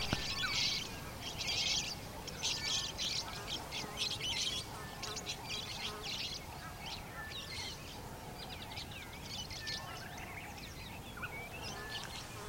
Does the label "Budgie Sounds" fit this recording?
Yes